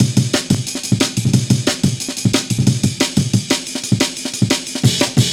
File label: amen